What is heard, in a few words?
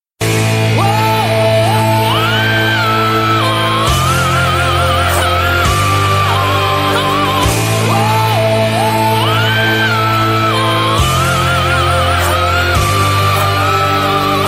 woah oh oh ah ah ah aaaa ha ah ah ah looped